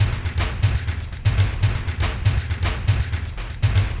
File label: 120 drum loop fx; methane and octane; hollywood audio design